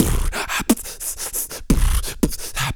lipo suction; Spectrasonics; Planet

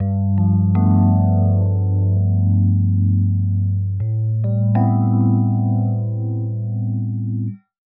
120 Rhodes Chords G, Keys, Nu Jazz House; PowerFX

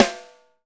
6X12 ALUMNUM, Living Drums

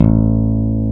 BASSES, Roland

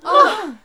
NOISE, CLASSIC VOX; Best Service; Hallelujah

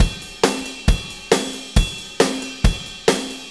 4-4 Loop, Drum Loops, Full Kit Loops; Sonic Foundry (Sony Creative Software); Loops & Samples Drum Tools

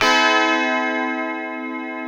A#3 FUNK CHORDS, Guitars; Spectrasonics; Hans Zimmer Guitars VOL-1

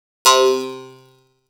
arpeggio base 5; Soundengine - CSR-000 The Art of Transwaves

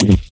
RSS, 160 BPM

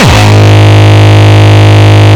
Longkick, kicks

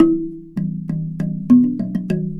Box Drum; Worldly Rhythms; Morning; Sonic Foundry (Sony Creative Software); Ma Ja Le Ethereal Textures

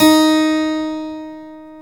KEY HRPCR, Roland; Roland - LA Composer vol. 1

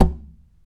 TAP, FLAMENCO Guitars

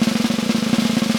CR, vintage snare drum; Q Up Arts; Johnny.C's Vintage Snare Drum

